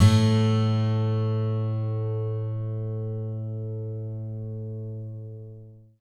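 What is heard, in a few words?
GTR A1 12; Synclavier Sampler Library; KEYS AND GUITARS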